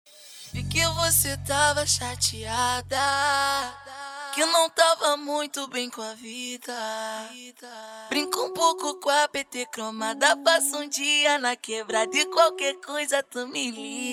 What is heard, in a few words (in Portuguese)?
mega funk; RADIO GUAIBASUL